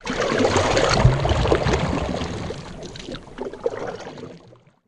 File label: Canoe Paddle Stroke Underwater